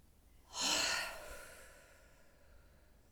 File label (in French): soupir; Index des soupirs 13 – Hadjithomas Joreige